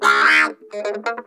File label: TOOTHPICK, Funk Guitar; 90 s Sample CDs; Zero G